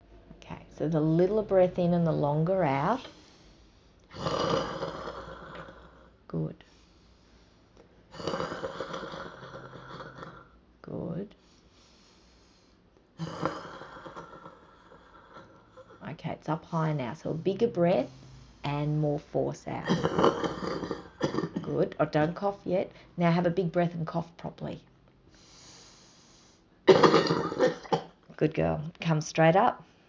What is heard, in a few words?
Staged huff well done